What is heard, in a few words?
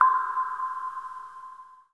Snare, Korg Z1